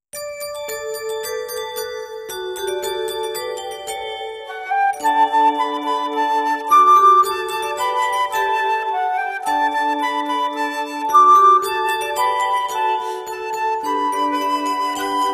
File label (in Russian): Музыка (Колокольчики звенят); Детские пения